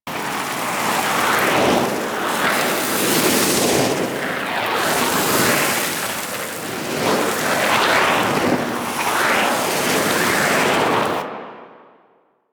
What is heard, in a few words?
Luck Fire Loop; Warframe; Warframes; Dragon